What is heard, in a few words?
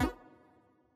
collect; Aviamasters; bonus